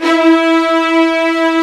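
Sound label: violins E 3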